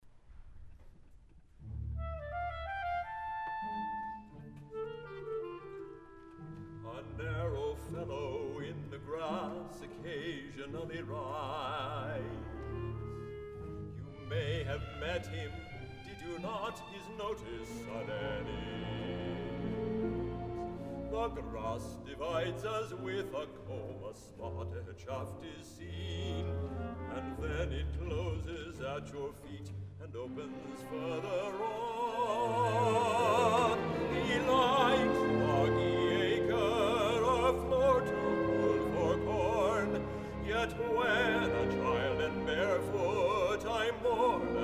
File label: Orch